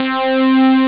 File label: lead